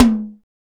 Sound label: TITETOM HI; Drums In Your FACE!